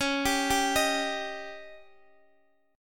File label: Db Suspended 2nd Flat 5th, Guitar, DADGAD